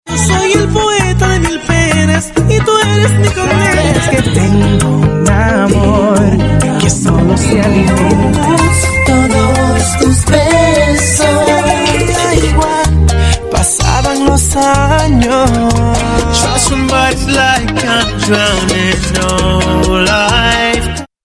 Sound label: bachata urbana